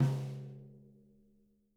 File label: SNRONMP L, Living Drums